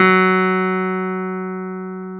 CLV, Clav; Roland L-CDX-02

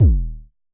Kicks D#1; Vermona Kick Lancet; LookIMadeAThing Kicks; Festival Kicks; Drums

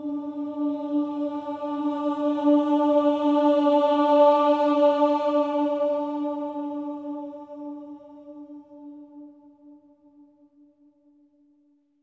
OH D4, Symphony of Voices, SWELL OHS; Spectrasonics

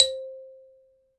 Xylo C4 mf; Digital Sound Factory Virtuoso

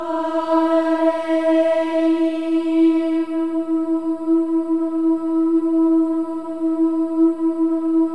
AH EH, Voices; Spectrasonics; Symphony of Voices; AH-EH-EE

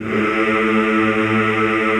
A1, Classical Choir; Best Service; Extended Classical Choir

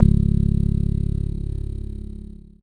C#, Synclav FM PLUCKED; Big Fish Audio

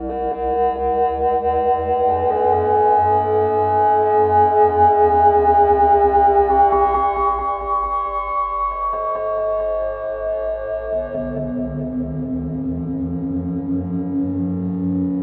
clangorous, analogue; Zero-G Ambiosis

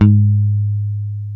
HIPH G#3, BASS; Spectrasonics; Bass Legends CD 1

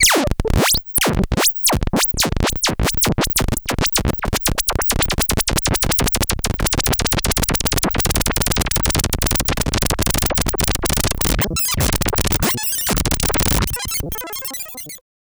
el blurps, special FX twisted; USB Soundscan; AKAI

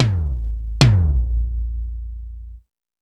Toms 1, drum machine; E-MU Pro-Cussion-1

